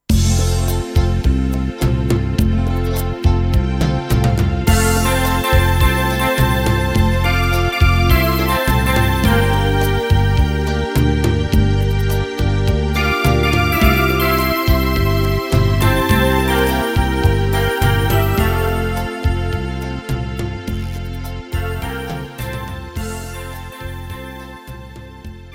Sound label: Duo Yamaha